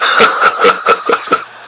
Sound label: super jump; freak fortress 2; roadside ravager